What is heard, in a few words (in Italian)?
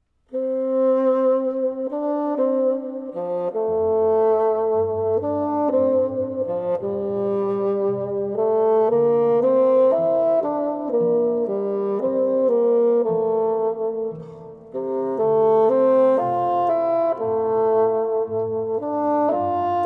Concerto per fagotto e orchestra Andante